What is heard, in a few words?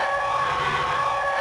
tie fly; gmod; star wars vehicles episode 1